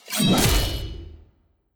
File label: Orc Cleaver Attack